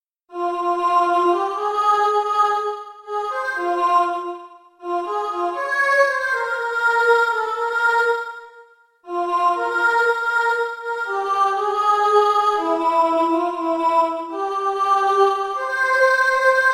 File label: ho ho ho alto